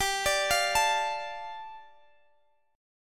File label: A 7th Suspended 4th Sharp 5th, G